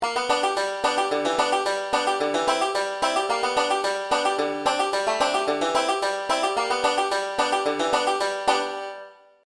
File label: 4 string hammer, banjo; beginning 5 string